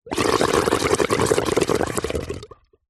water pipe gurgle; nature

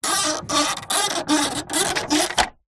foley plastic turn squeak; zapsplat

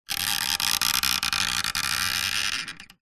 wood handle turn creak; foley

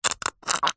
foley liquid gel squeeze short squirt wet squelch

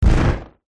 impact slam hit hard; sound design

